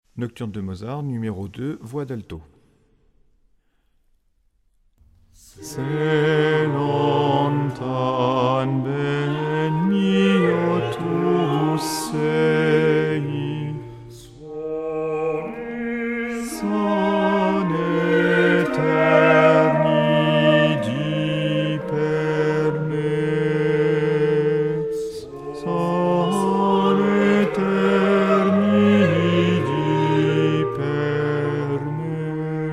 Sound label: alto; se lontan